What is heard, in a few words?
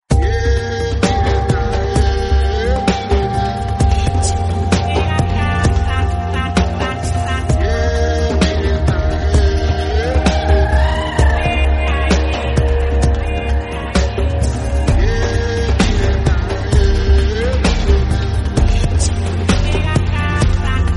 african dream pop ringtone